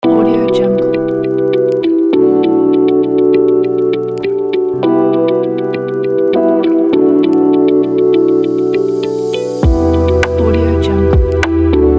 dreamy chill

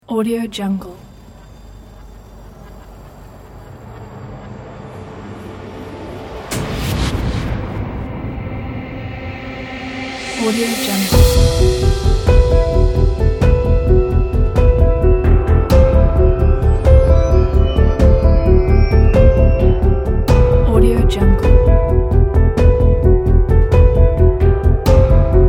dark suspense

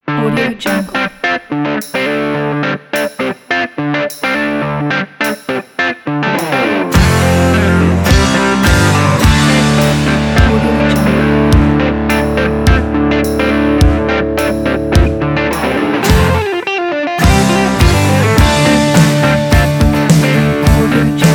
dirty blues country rock

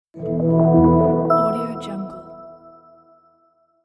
simple logo sting

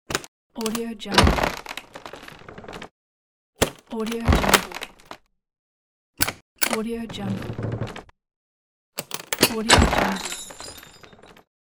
trunk

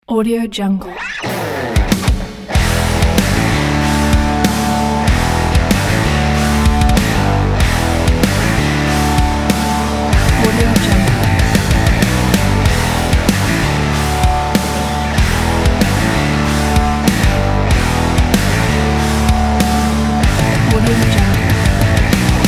rock logo

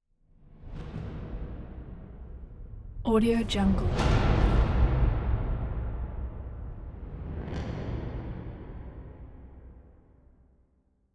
tension hall breaths; soundmorph

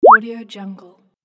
bleep; 3dhome

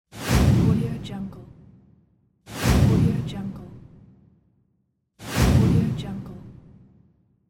whooshs transitions

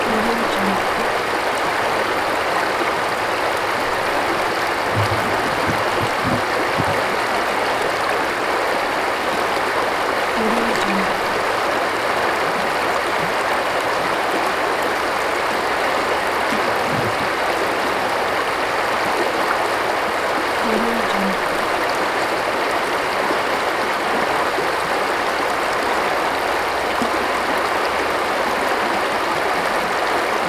fast river water stream

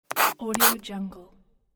ink pen cross out

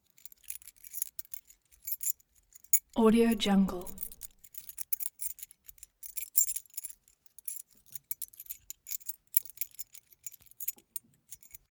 object key key ring small rattle sequence

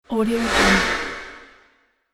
long tail whoosh

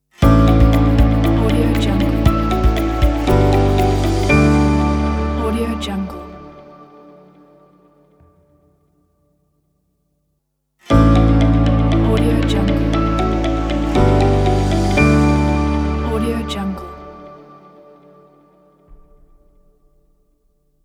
tech logo; artofsoul

